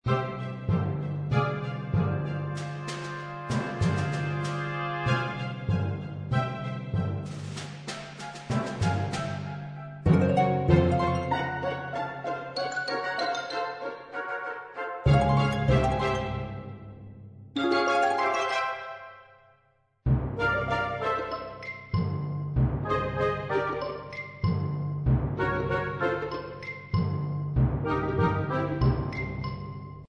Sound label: combat planning music